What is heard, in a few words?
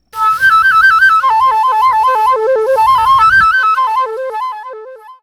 FLUTE FX